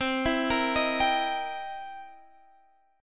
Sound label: C 7th Sharp 9th C Guitar Standard; arpeggiated; JGuitar